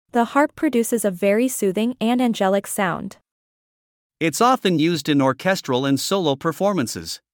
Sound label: Harp